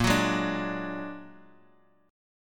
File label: A sharp Augmented 7th, Guitar DADGAD